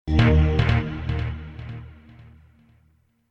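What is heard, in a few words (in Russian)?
оркестр футуристичный аккорд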